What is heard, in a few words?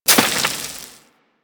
Melee Impact Dirt; Warframe; Weapons